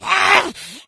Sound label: rage at victim, infected female